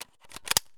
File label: sv98 mag in; Escape from Tarkov